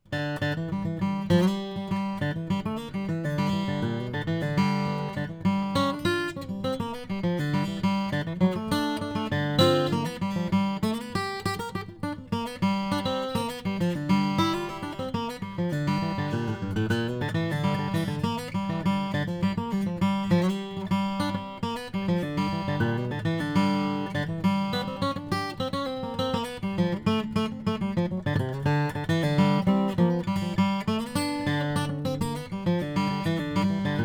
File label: CB Model J Brazilian; Chris Bozung